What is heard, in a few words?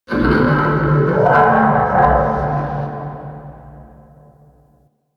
Search Lights Growl; Mission One; Warframe; The New War